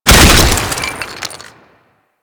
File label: Frost Avalanche Ice Chunk; Warframe; Warframes